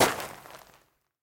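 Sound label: hit single unprofessional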